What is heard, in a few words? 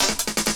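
LOOP snare drum, Drum & Bass, 160 BPM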